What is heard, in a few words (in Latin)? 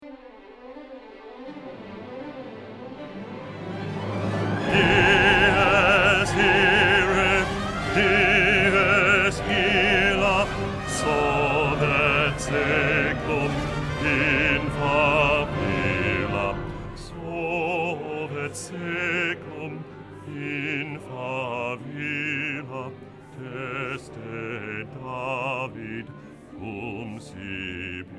Dies irae, Requiem; requiem verdi